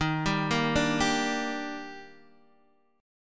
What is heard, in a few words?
Eb Major 7th